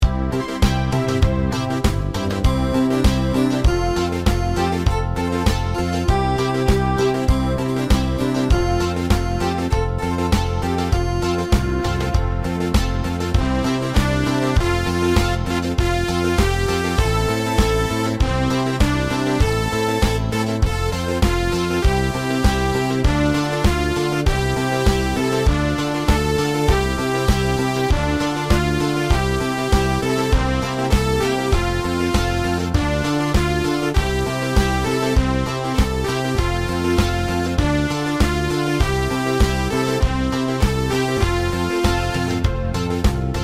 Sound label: beat